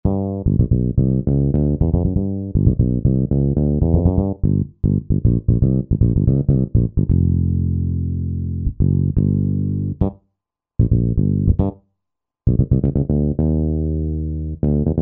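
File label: Fretless Bend; Roland SR-JV80-10 Bass & Drums; MM FrtlsBend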